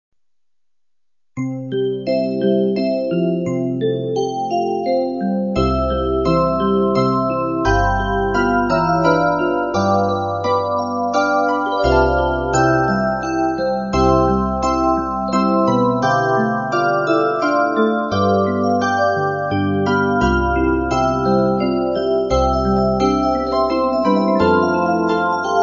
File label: Polish Lullaby